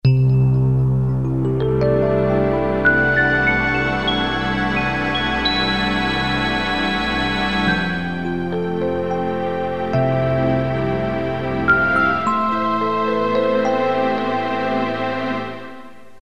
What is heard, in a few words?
Strings